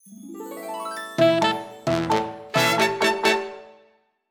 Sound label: Finishing House